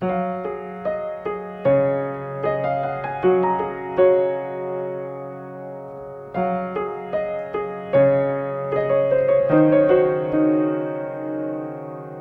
piano

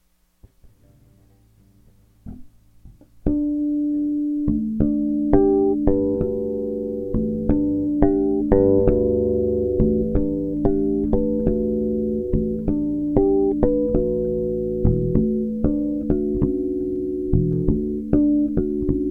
harmonic riff

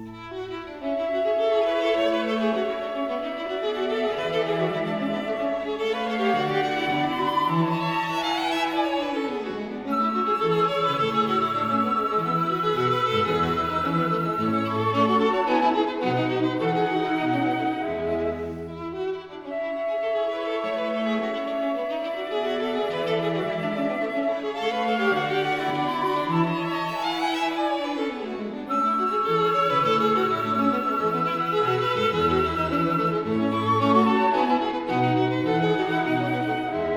flute, String Quartet; in A, K.298; Variation II; Mozart Flute Quartet

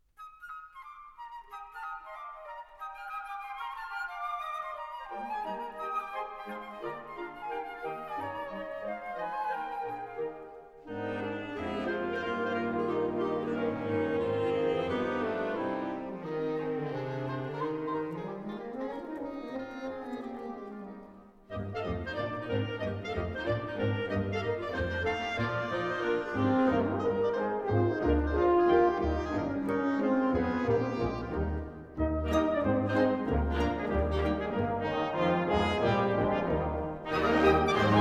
Wind Orchestra; Grainger Edition Vol 4; Country Gardens

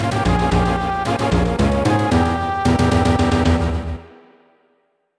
Lose Life; Donkey Kong Country 2- Diddy's Kong Quest

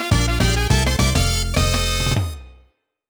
Bonus Clear; Donkey Kong Country 2- Diddy's Kong Quest

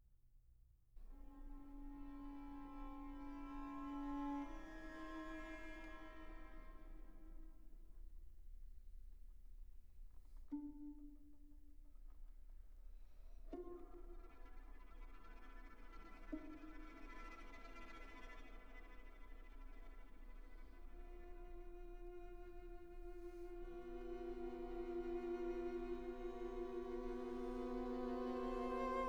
String Quartet, Äußerst langsam; Six Bagatelles for String Quartet, op. 9

